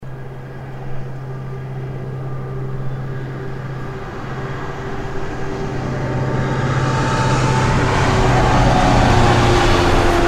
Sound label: NormTrains